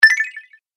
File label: Collect Magic Prize, video game sound effects